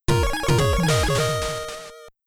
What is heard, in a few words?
Item Get